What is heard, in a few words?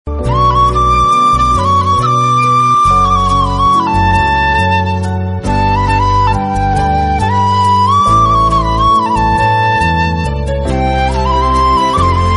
flute bgm